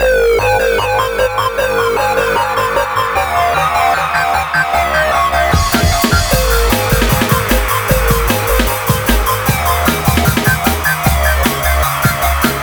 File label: Robot Live 152 Abmaj, Hardcore Breakbeat; Big Fish Audio; Hardcore Breakbeat Action